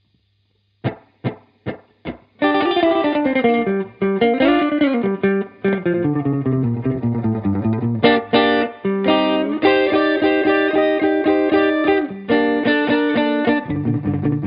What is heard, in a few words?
blues